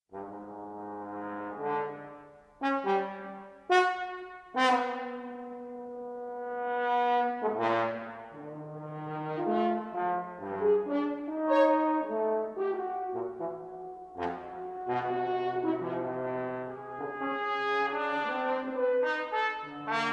Brass Trio Moderately

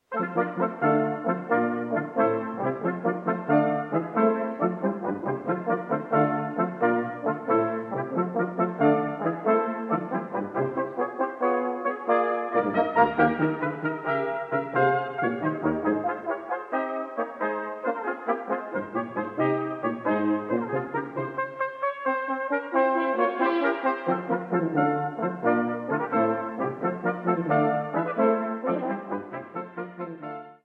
Brass Sextet

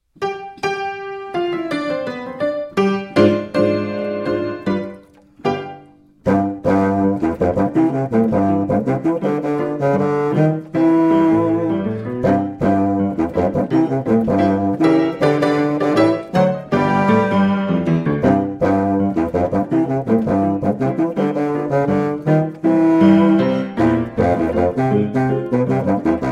A Ragtime Two Step; The Strenuous Life

